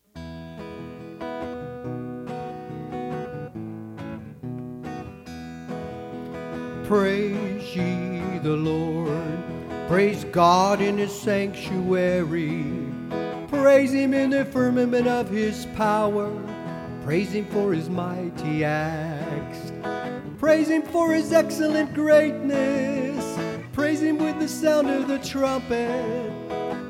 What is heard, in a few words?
PS 150 THE APOSTLE VOCAL; The Fivefold Psalms